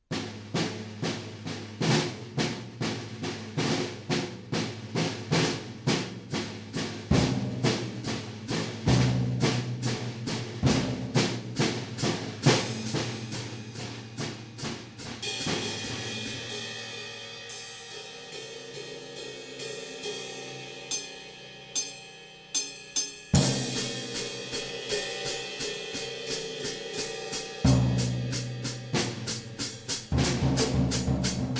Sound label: drums; At the Count of 3